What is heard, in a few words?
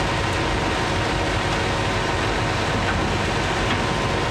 big mining drill working loop; Factorio